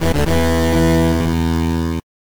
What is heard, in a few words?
Death; 1001 Spikes